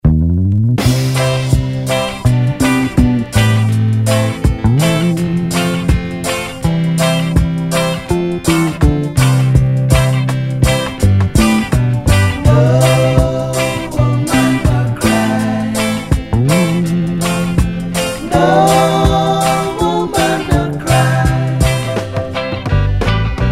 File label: REGGAE Disco Reggae